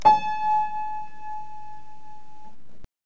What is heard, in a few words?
Piano